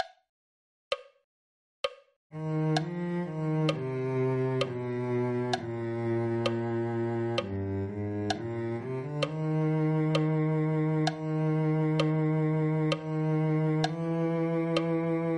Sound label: cello